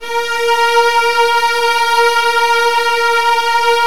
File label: A#2, Virtuoso Strings; ILIO; Kirk Hunter Virtuoso Strings VOL-2